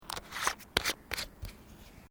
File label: Vending Machine Softdrink Screwtop Close; Japanese vending machine; Machinery